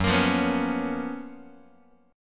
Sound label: Gb Major 11th Gb, Guitar; GbM11 strummed; JGuitar